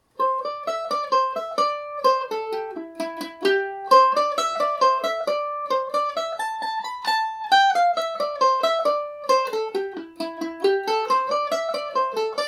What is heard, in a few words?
her lovely golden hair flowed down her back amin, mandolin